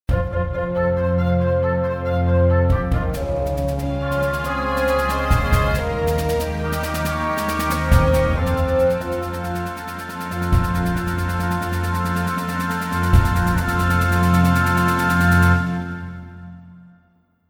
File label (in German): Mollfanfare, klingelton